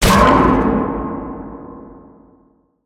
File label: scream, ghast; mob; Minecraft; Votive World